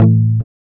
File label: GUI A, Acoustic, Roland